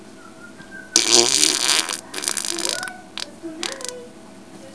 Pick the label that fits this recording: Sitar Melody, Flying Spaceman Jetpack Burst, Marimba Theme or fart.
fart